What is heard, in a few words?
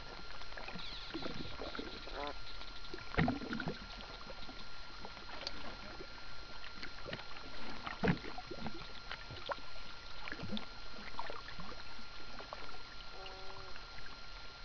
mixed sounds toadfish honk unknown water; soniferous